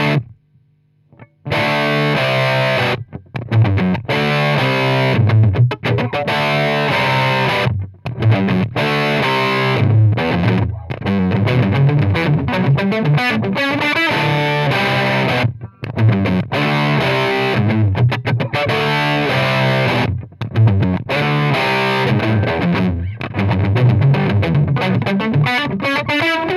disto both; brunetti pirata141

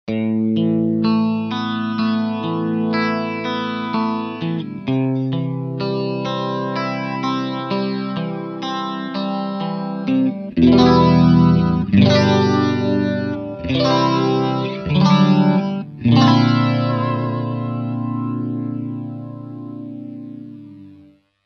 Space chorus tri strat; line6; tonecore; pod

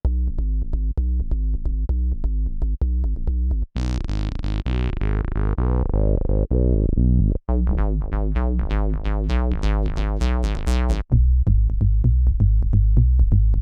synth bass, Yamaha tyros3

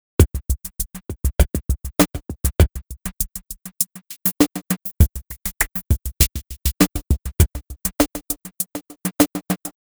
stutter; sugar bytes; effectrix

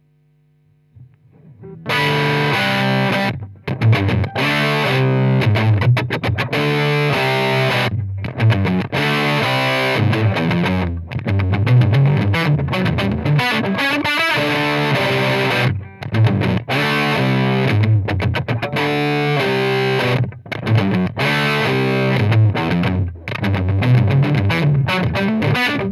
disto 6l6; brunetti pirata141

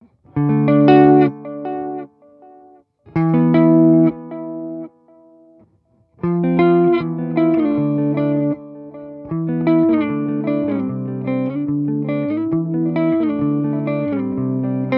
tape echo; line6 spidervalve; effets

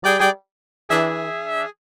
blues, brass; arturia

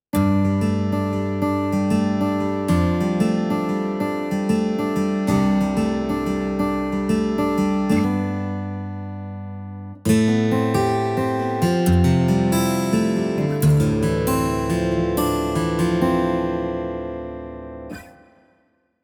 guitar steel, yamaha tyros3

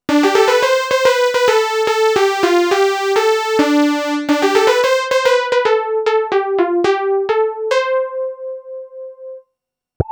leads, waldorf blofeld

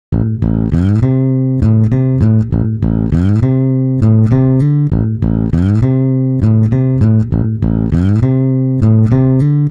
bass, monophonic; apple logic pro

